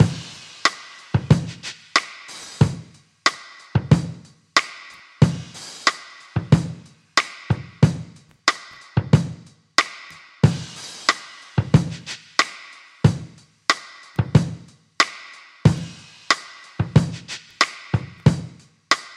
92 bpm drum groove e key, Free drum groove - kick tuned to the E note. Loudest frequency: 1450Hz